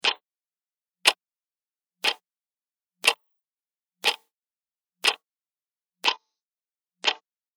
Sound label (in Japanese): 置き時計の秒針; 置き時計の秒針の音8回分; カウントダウン; 生活音